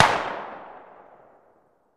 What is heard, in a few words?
Gun Shot Sngl Fire